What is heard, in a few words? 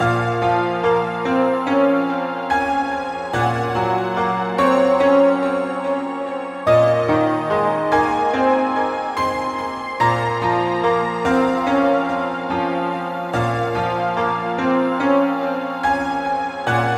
Credits horror loop; nekocharm